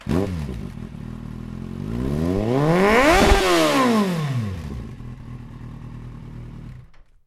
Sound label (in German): kawasaki z800 starten einmal